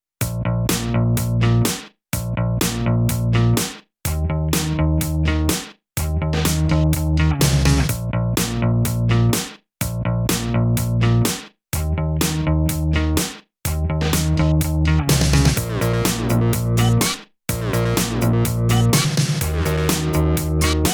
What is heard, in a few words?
instrumental